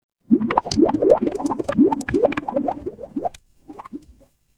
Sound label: water bubble